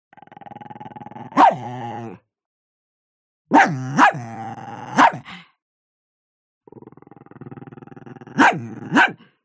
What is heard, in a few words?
DOG Chihuahua Growl Bark; ANIMAL; smartsound